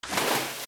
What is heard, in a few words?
Splash; Morpha; zelda; OOT